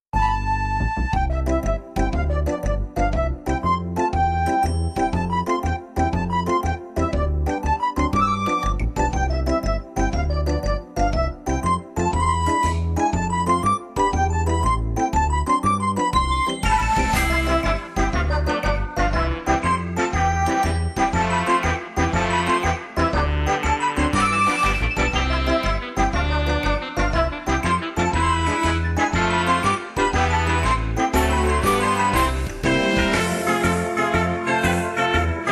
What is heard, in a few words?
Entrance Music; Twankey